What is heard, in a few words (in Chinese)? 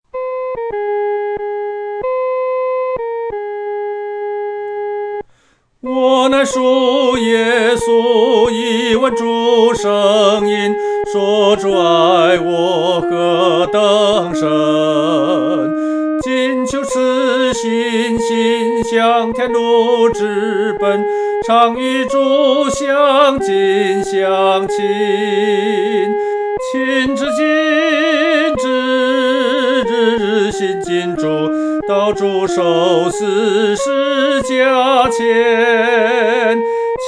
我乃属耶稣 独唱（第一声）